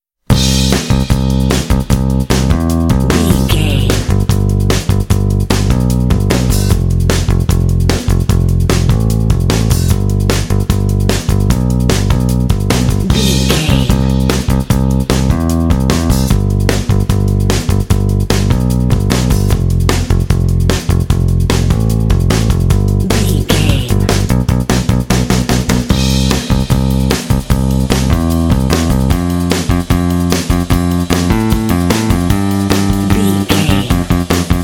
groovy

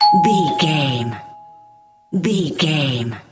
doorbell ring single

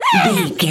voice fun small character emote angry

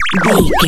retro magic spell cast

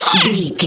goblin fairy attack

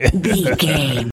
voice fun man character deep laugh

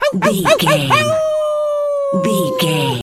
wolf howl

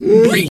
pig hog single

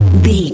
retro ui menu error

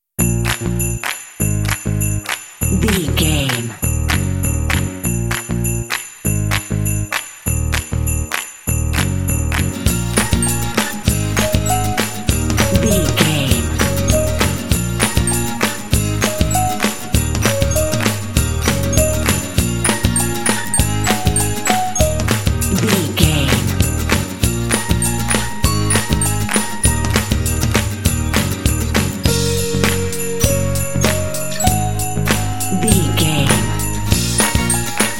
indie pop holiday fun

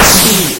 retro spaceship jet boost

